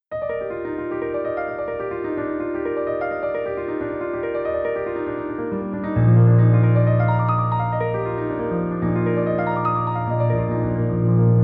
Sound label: Piano